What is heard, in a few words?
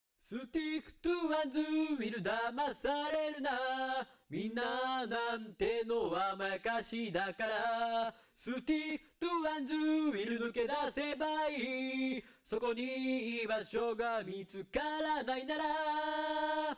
Vocal